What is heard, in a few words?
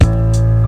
mid heavy kick drum one shot A key